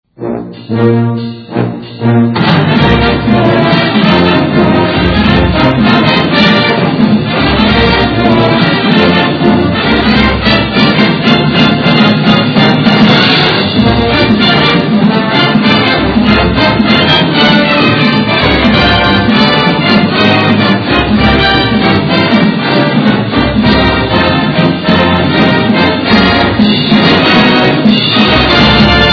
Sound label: jazz; TCU; The Southwest Conference Fight Songs, Alma Maters, and Cheers